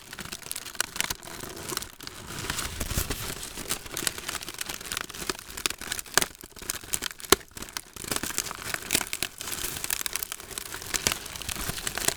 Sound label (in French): craquements